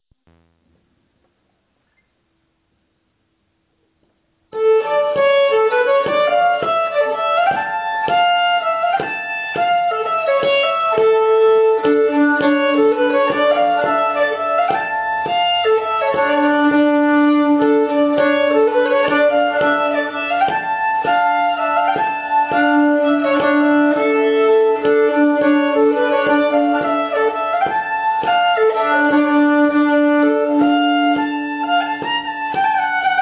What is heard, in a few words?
Finnskogs Rapide